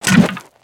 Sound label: Basic Melee; creatures organic; Skullken; aronwk collection; ndaudio